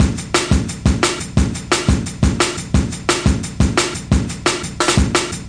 bad 80s, drum loops and breaks, 175 bpm

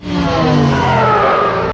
Electro FX; Ueb HE Electro FX

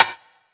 classic hh Rim Snr; STYLE KITS (Hip Hop, Etc); Classic Hip Hop Mega Kit

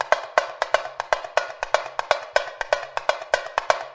tamborim, drum loops and breaks

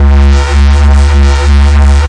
desecrated bass hit, Evil Bass Sounds; Desecrated Productions

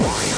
obnoxious kick

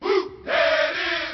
Whoop

